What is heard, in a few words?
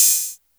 HiHat, Roland R-70